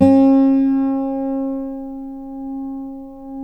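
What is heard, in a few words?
Guild 12 String; Guitars; Spectrasonics; Hans Zimmer Guitars VOL-1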